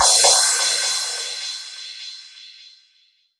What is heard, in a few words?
Crash, Cymbals; Vengeance Samples